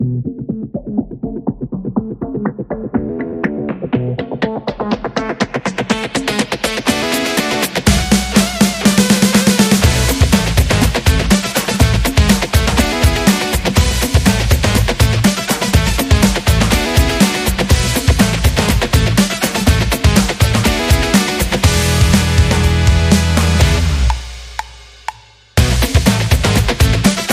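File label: Gambling, sport and action music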